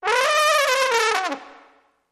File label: Trombone Elephant Call